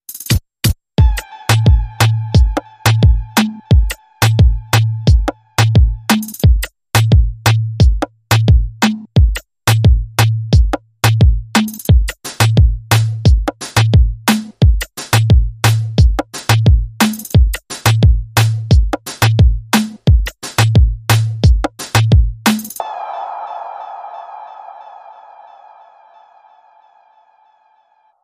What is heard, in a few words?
Beat